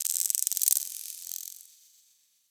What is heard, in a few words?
Tech Very Small B, Ambience; Warframe; Cephalon